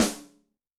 Loosy Rim, Yamaha DTXpress-II snare

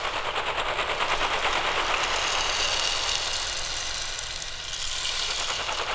Rainstick; Sonic Foundry (Sony Creative Software); Ma Ja Le Ethereal Textures; Noise Percussion

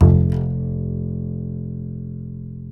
F 2, Bass; Spectrasonics; Bass Legends

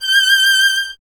STR VLN, Vln Detache; Miroslav Vitous

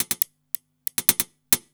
percussion loops, Techno; PS-45

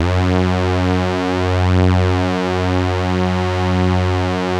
F#, GLIDE, Synth; InVision Interactive; Keith Emerson; The Most Dangerous Synth and Organ

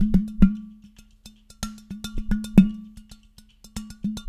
BRG SM POT, ETHNIC INST; Spectrasonics; Liquid Grooves 1 & 2

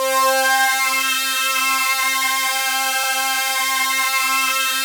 SYN, analog; Sound & Vision - Gigapack I CD 2 (Roland)

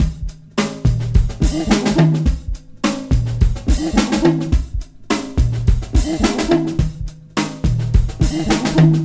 29 FUNKY MONKEY 106 bpm, Loops Breaks; POTBreaks

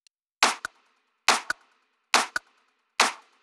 drum Loop 140BPM Clap

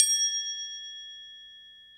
Crotales, Orchestral Perc

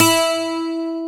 12STR, AC.GTRS

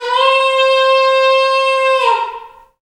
Bullhorn, Afro Horns, Africa

